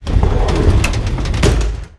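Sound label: turn close door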